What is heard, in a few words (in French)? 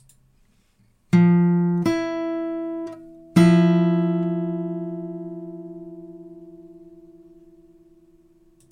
septième, fingerstyle guitare